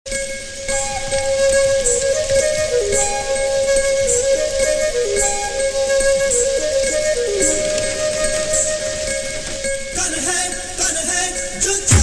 Best Flute

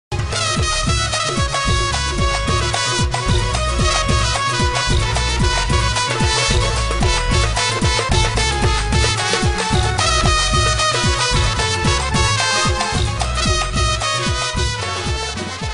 Trumpet

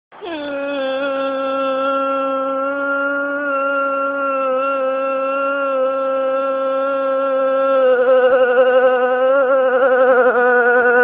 Funny Sms

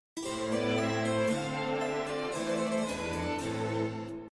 Jingle

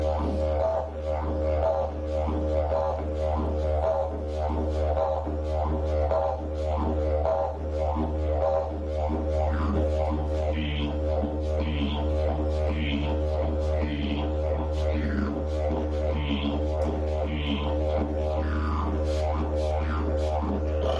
Didgeridoo

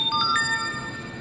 Ring; Zebra

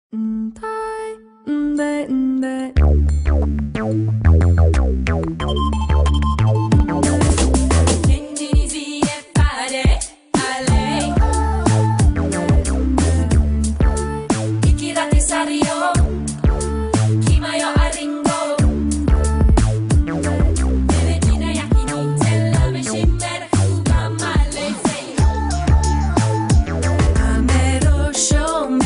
Airtel New Tune

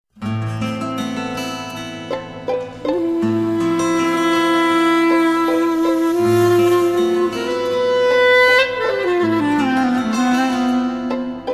Sad Flute

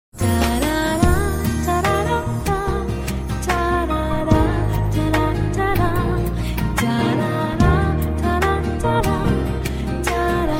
Beautiful Vocal

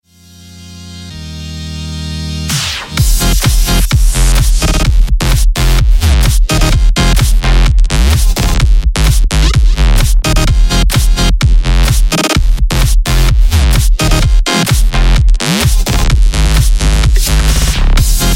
Ringing

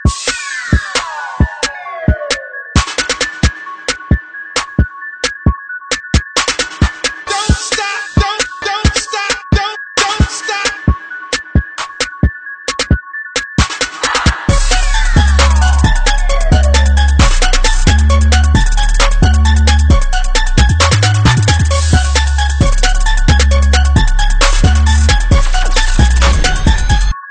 Snare Bass Shake

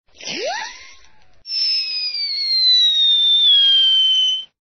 Rocket

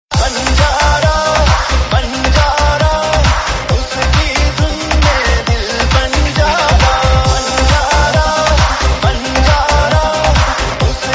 Remix

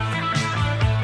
Seagulls Strum

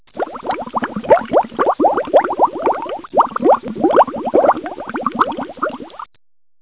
Bubble

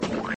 Splat

